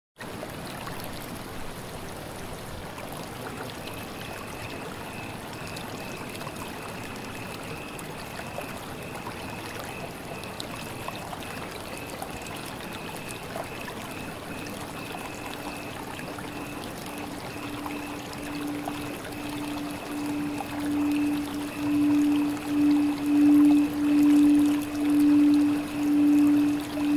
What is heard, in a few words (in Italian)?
campane tibetane e suono dell acqua che scorre Piano Suoni della Natura; Suonoterapia con le campane tibetane e suono dell acqua che scorre; Relax